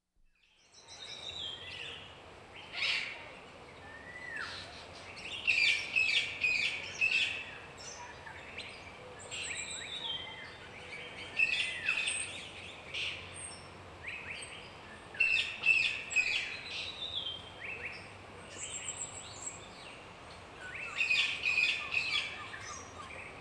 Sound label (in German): Urwald Voegel im Regenwald